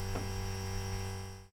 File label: elevator